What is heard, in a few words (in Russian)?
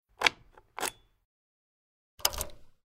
zvuk klyuchei motocykla